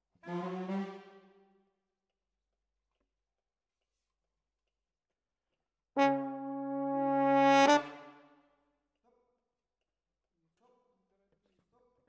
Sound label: Horns